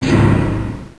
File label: arm Swap; PC Gamer IT CD 48; Big bang